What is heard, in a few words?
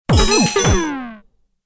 scifi malfunction data